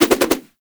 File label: Nunchaku Spin Whoosh; Weapons; Warframe; Tenno